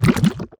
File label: spiders mechanic spawner regenerate